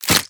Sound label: bite